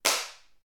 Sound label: High Five; LOOPERS